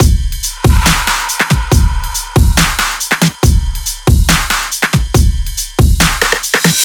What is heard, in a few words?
140 DRUM FULL